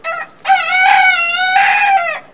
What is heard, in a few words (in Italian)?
gallo, versi degli animali; animalandia